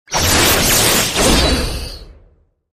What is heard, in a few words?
skill loop; blademaster